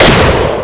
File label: fire